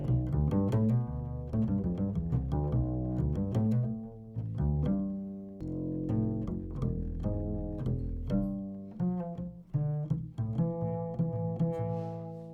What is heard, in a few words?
Double Bass Hi solo